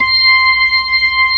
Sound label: B3, Drawbars; Keyboards; E-MU Producer Series Vol. 2; More Studio Essentials